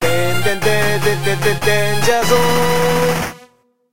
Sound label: Jingle Dungeon Danger Start; Dandy Dungeon Legend of Brave Yamada